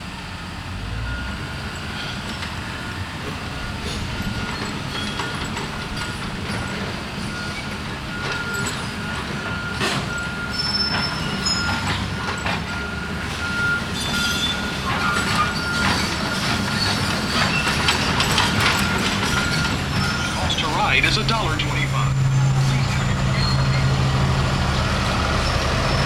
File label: Downtown Houston Criminal Justice Center Construction Ambient